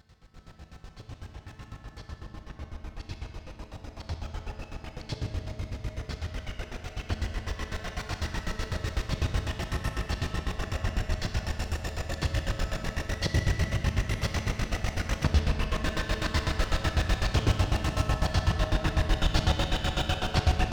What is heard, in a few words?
Soft Drill Noise Hard Dance Mix